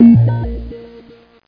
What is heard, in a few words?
CANCEL; CD Action